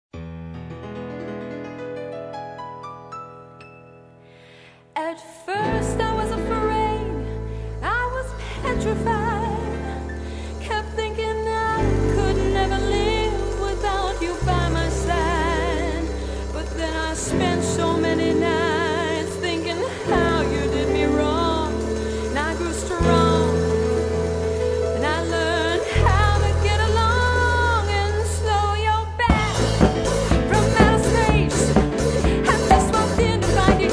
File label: vocals